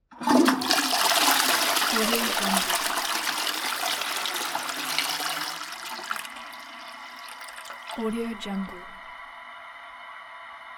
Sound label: toilet flush